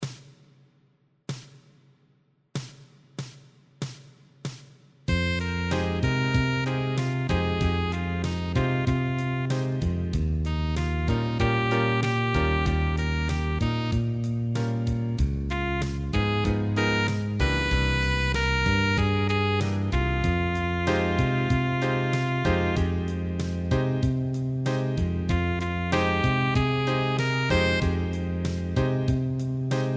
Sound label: play along guitar